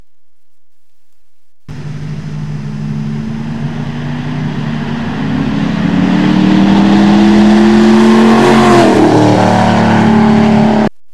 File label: Mustang Drive By Fast